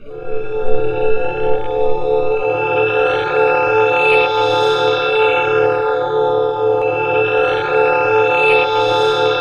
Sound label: SCARY PATCH; MELODICS